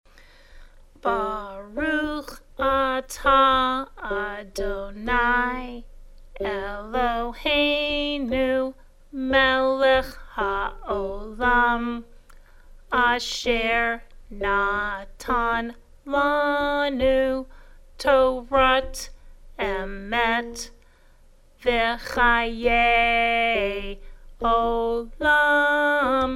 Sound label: After Torah Blessing Slow Speed